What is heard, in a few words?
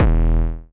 808's